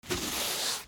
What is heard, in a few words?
FRICTION